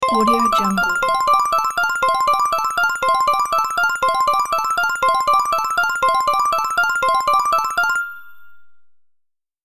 payout tally score

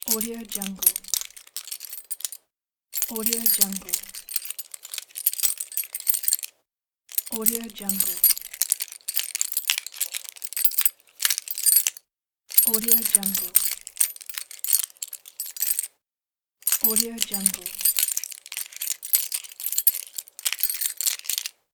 the keys

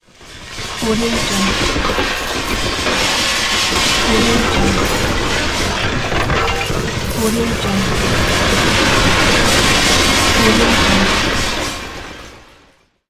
rubble dumping

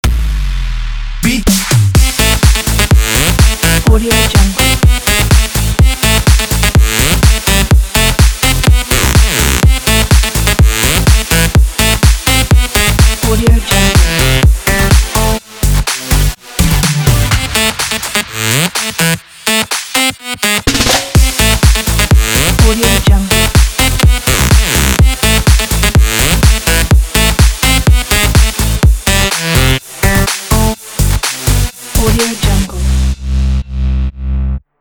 poisonous dance edm

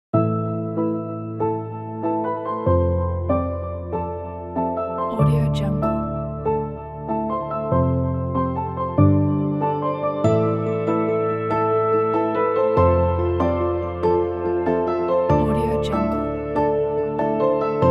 beautiful slow motion

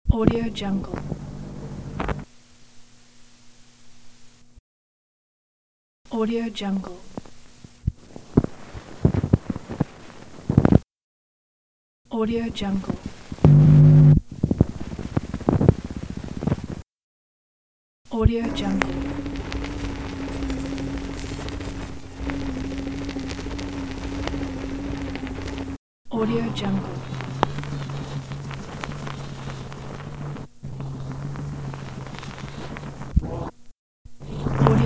old tape film crackling

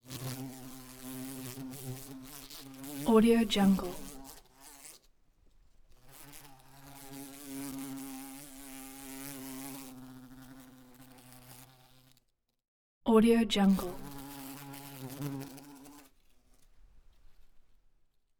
bumble bee flight wings close

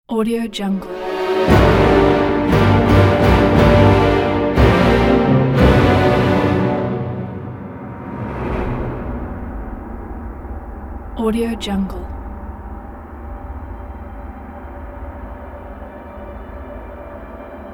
dark epic orchestral

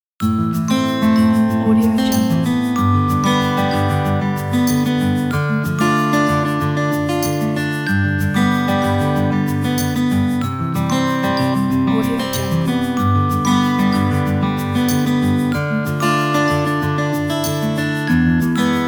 soft sad guitars

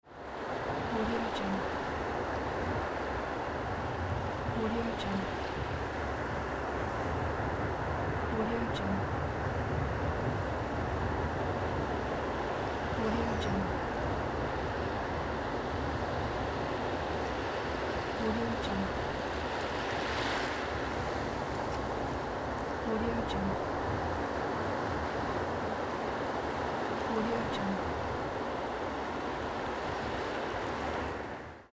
sea

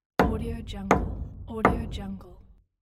nail into wall; erhanbicici

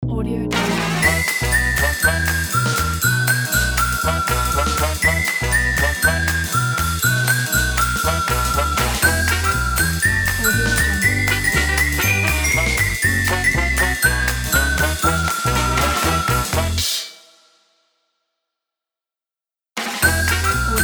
jazzy christmas logo

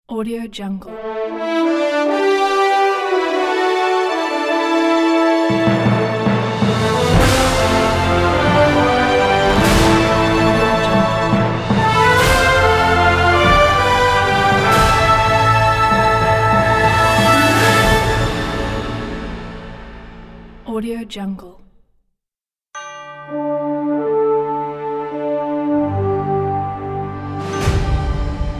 orchestral logo pack; flamelionstudio

